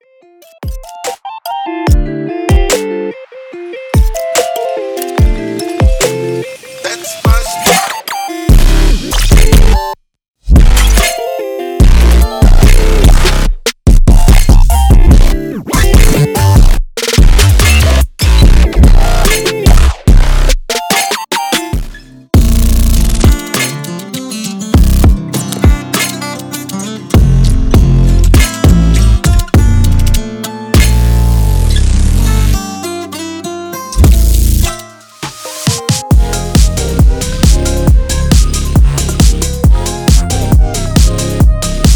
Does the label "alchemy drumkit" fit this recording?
Yes